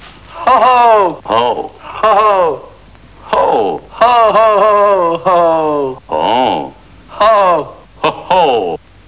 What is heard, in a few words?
hoho